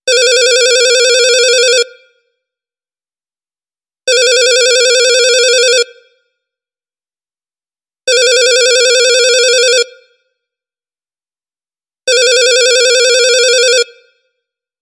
Retro ring

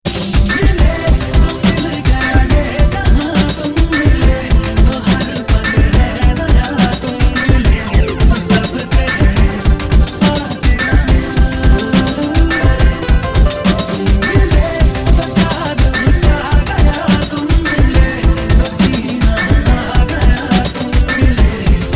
Drum Mix

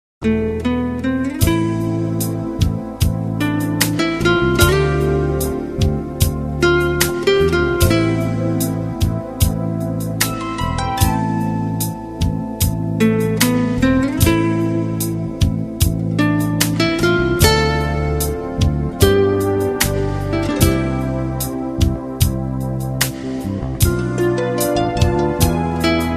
Romantic Guitar

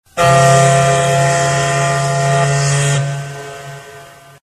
Boat Horn Sms Tone

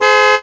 Claxson

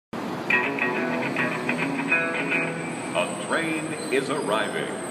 Denver train

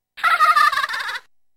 Laughing; Chun-li

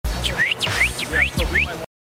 Cat Call

